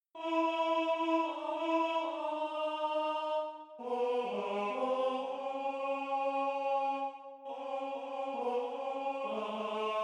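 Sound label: barbershop tags, baritone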